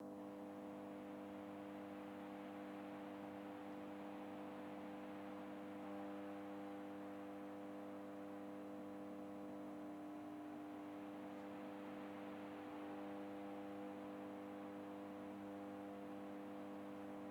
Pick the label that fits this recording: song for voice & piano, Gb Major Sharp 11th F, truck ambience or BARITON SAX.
truck ambience